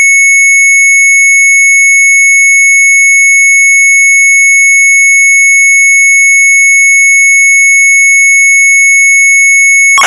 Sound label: MZ800 Tape, sharp; mz800 wav